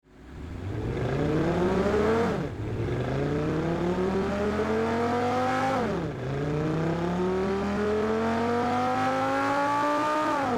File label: Sports car engine acceleration and run exterior